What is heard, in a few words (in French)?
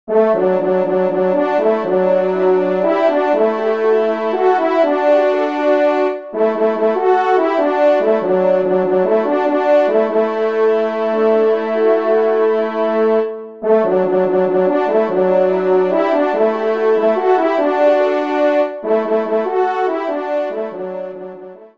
trompe; Hymne Auvergne; Lac Aydat